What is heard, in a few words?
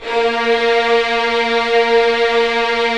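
Strings Violins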